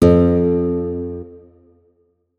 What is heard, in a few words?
notes, guitar; Hideout Furniture